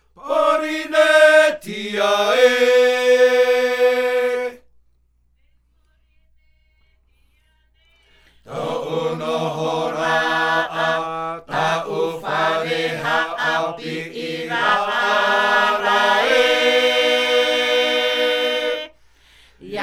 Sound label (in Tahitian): Himene ruau; Reo piti